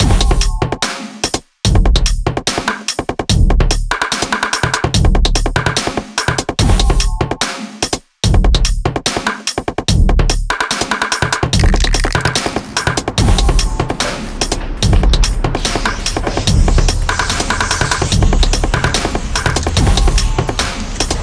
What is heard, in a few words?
loop